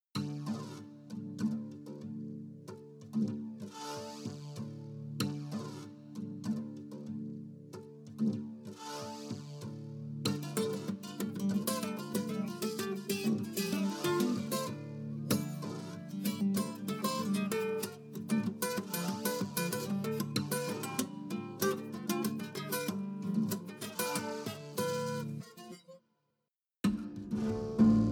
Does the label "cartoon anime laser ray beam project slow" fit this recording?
No